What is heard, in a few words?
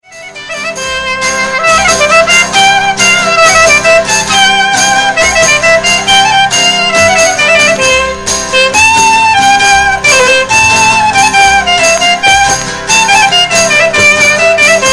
Syrtos Thrakis